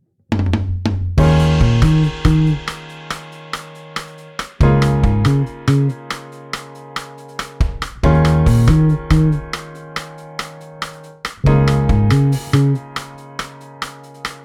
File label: chant